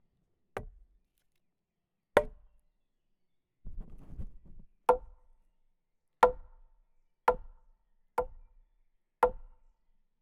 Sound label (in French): Baton sur buche serie, Percu Bois